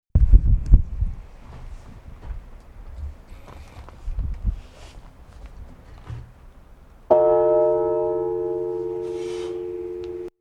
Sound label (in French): cloche; Bourg Saint Andéol 2